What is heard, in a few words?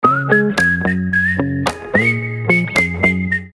high whistle